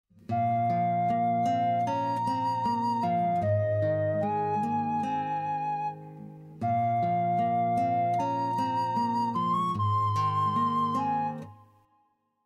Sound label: Minstrel Music Inst